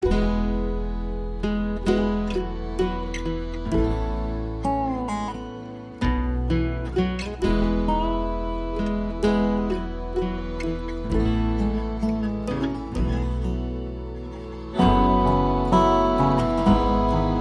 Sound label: Folk Ballad; Folk Ballad 1